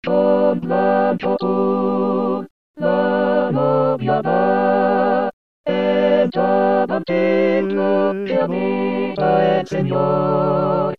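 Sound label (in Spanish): marcha nupcial, todos; marchamupcial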